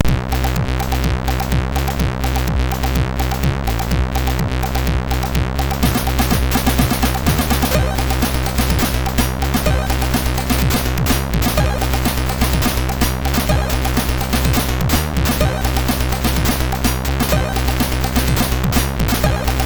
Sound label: Techno, MOS6581R3; Shade